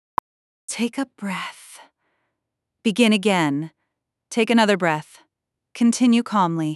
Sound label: breaths